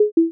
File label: beep error